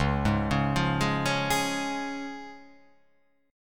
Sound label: C sharp Major; x,4,6,6,5,3,3,5